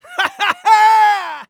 vce exclaim swing

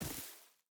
footstep rubber boots grass run; Rust

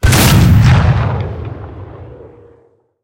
cannon 88mm Pak43 shot

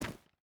footstep boots stones run; Rust

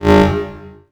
cube failed alarm

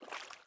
ui pickup water; Rust

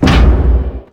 walk

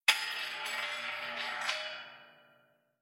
shells 20mm hull

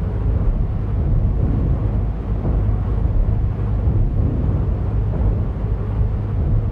submarines engines; World Of Warships

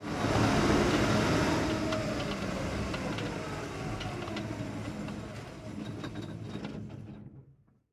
engines; World of Tanks

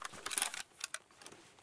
weap in; Escape from Tarkov

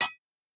flint strike; Rust

